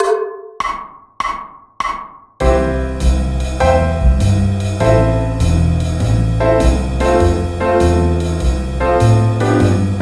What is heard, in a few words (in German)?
akkordfolge C-Dur; irealpro